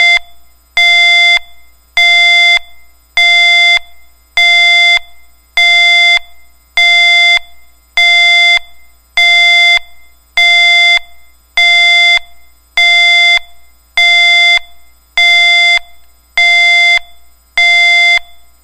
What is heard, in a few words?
special warning